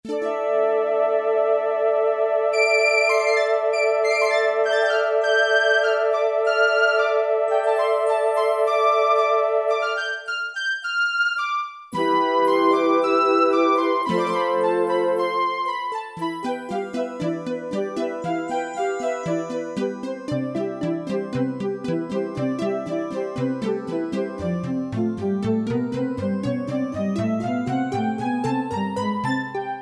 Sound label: ICE AGE, Casio VZ-10M